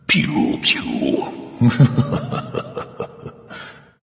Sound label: victory vox; smite; thanatos